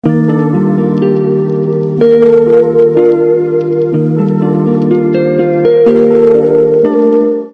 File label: ios 17 new alarm